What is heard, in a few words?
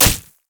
basic attacks; quinn